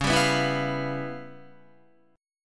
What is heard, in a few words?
Db 7th Sharp 9th Flat 5th Db down Guitar; Db7#9b5 strummed; JGuitar